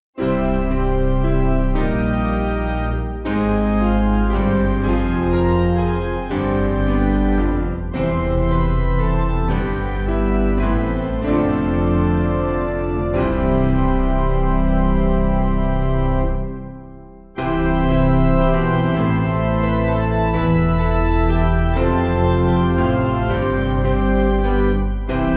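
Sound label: Organ Piano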